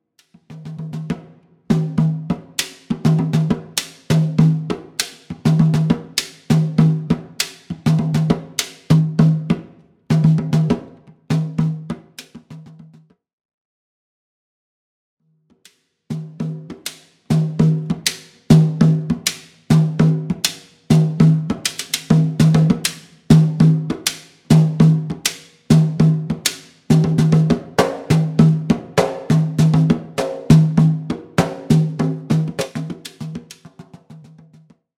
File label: repique cells